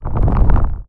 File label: Pillars Up